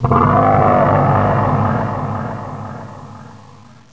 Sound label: eyeball cry